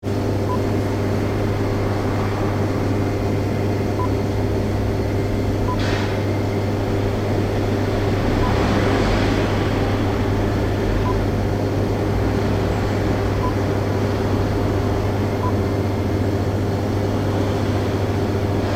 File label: Garage Waiting Room Interior